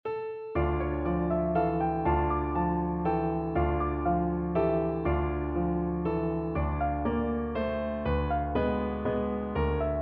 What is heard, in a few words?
grieg peer gynt, piano duet